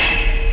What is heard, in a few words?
hammer